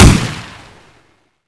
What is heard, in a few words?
ump45; weapons; cstrike